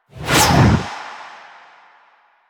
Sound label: Stalker Scythe Whoosh; Weapons; Warframe